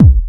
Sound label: BD, 2 Step; USB Soundscan vol.51; House Side Of 2 Step; AKAI